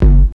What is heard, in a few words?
KICK, Hip Hop